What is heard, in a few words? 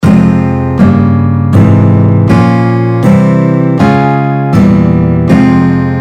Fm harmonic minor chord scale, guitar